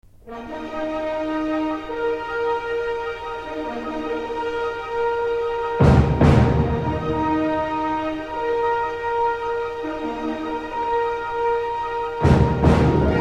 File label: Sound Effects - Universal Movie Intro